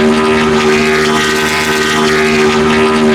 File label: EXT high, Vehicle; NASCAR Thunder 2004